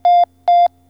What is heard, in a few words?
PHONE